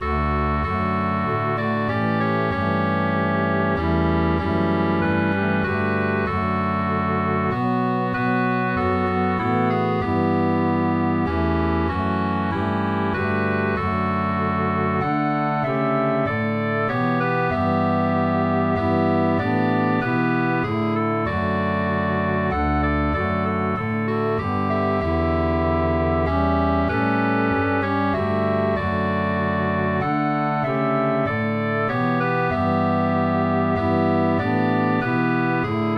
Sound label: Melody Harmony Bass; Santa Lucia